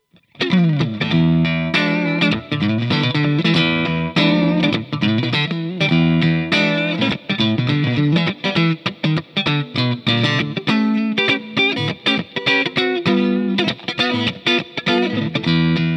Clean Neck Pickup Single Coil Humbucker

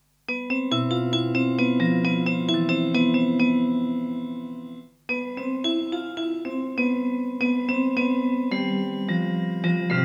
Lexicon PCM70 Small Plate Mallets

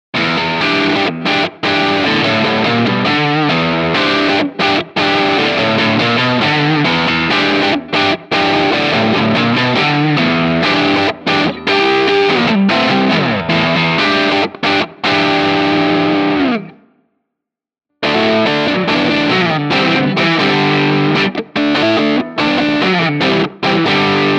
ESP SC 20 Neck PU high gain

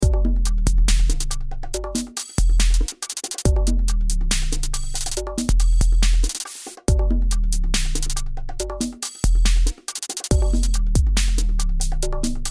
drumgroup comp; magnetismus 2

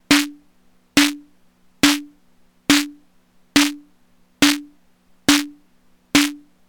korg volca beats snare no cap

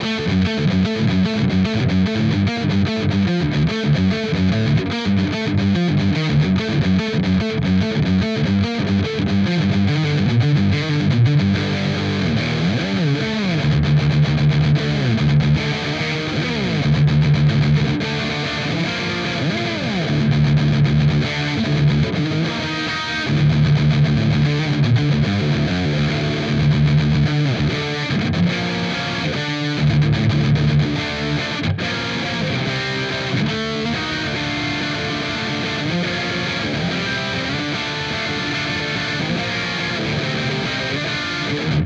Neural DSP Quad Cortex Neural Capture ENGL

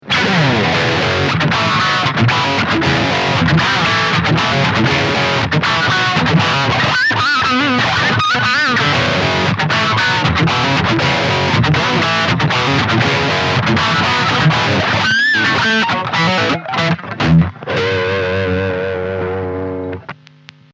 Lead

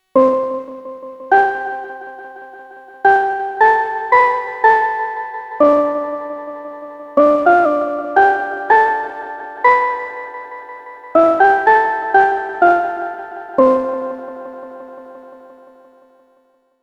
Modal Craft Synth 2.0

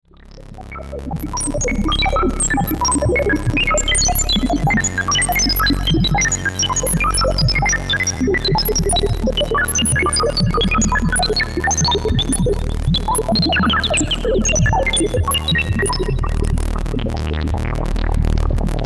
s-h filter

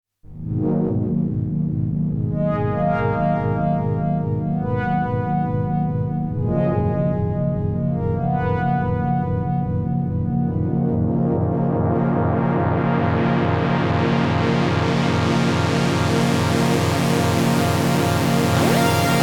Pad Lead; Behringer Pro-800

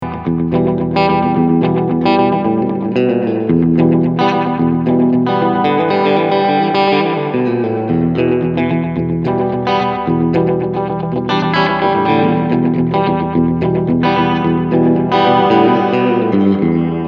Echorec Short Delay

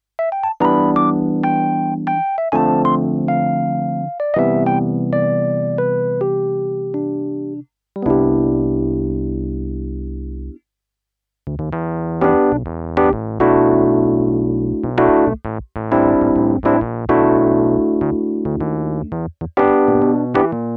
Rhodes Tube

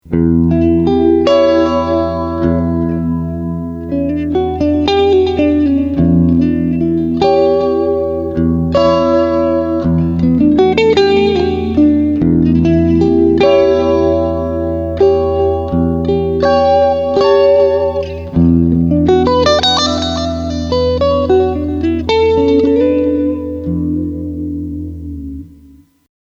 clean flanger dorian

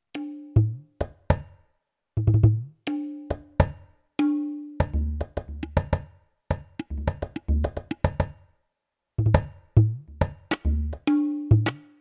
ROLAND SPD-20 PRO Kit Tabla

